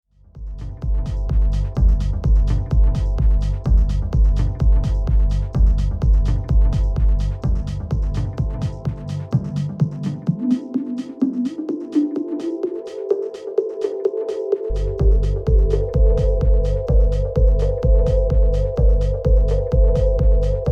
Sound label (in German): Pioneer DJS 1000 HPF div. Kanaele